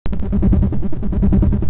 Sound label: 100Hz Doppler Flange